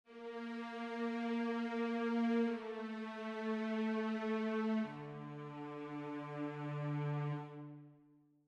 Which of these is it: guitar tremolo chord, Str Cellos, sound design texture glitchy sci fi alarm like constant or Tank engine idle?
Str Cellos